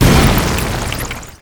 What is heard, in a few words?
trickling fear; weapons; dota; nightstalker